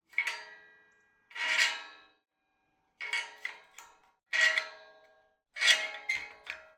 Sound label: Iron gate, latch, sliding, rotating, jostle, fiddle; Eiravaein Works; Vaeyan IV